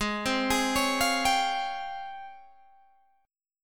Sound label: G sharp Major 13th, Guitar, Standard; G#M13 arpeggiated